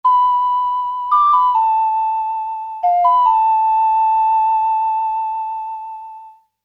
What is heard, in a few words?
ocarina (sea roar bossanova); Majoras Mask Official Soundtrack